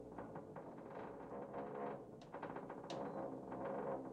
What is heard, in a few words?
Rope Loop Med